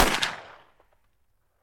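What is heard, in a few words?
revolver sighting-in shots at combat exercises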